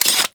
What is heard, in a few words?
Blade Open; Infested; Mios; Weapons